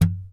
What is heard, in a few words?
MARTIN AC, Bass; Sampleheads; Will Lee Bass Library; MARTIN AC 4